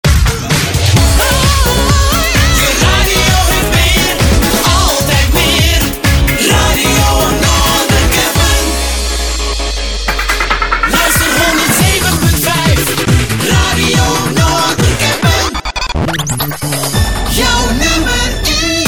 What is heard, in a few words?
Jingles